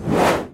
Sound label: tiny woosh; egypt stone war online